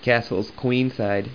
OOO; chess

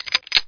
m203 load; specops

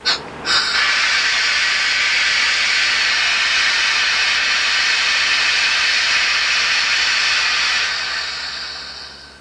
hiss, rail; transpo

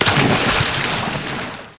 splash; SINDEMO; impact; water

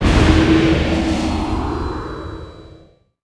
ship wing wave

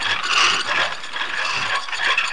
pulley; CD Action; soultrap

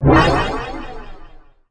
cloaking flash

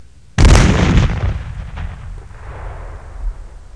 Cannon; Waterloo

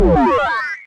menu Ok; Gem Mine

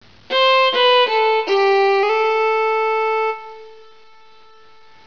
violin

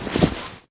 Bag Stash; kult

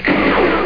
Two Shot; Museum of Anything Goes

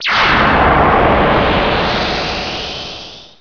bakuhat

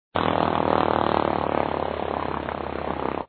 bank Down; doggler vs barron von hare

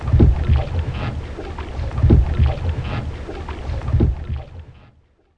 row; ancient

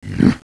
golem Move; NETSTORM

